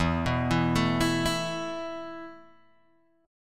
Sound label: E Suspended 4th E, down, Guitar, Open G